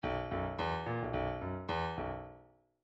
midi file grooves rock

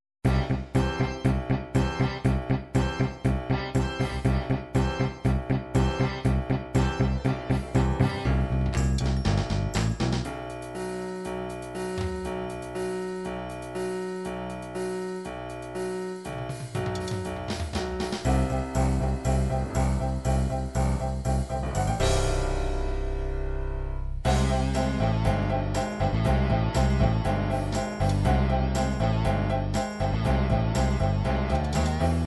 rock caballo, midi

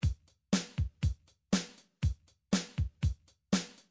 drums modern, midi drum patterns

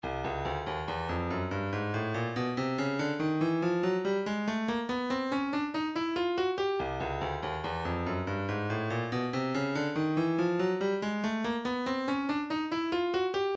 separated elements hihat

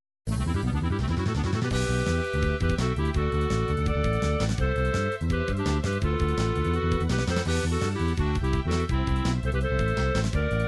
tense atmosphere; super nintendo star ocean japan

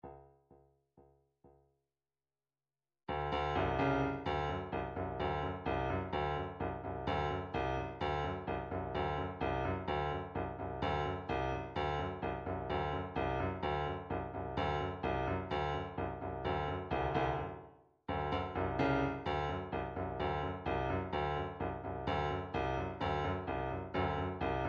drum trax country 128

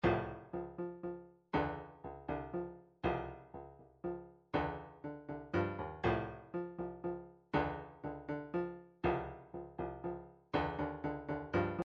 midi drums

